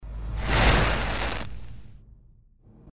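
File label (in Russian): испепеляющий; Звук огня; Звук огня для монтажа перехода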